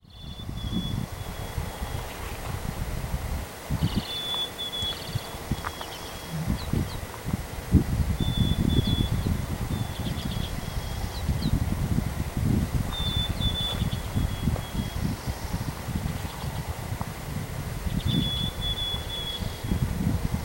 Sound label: bird sounds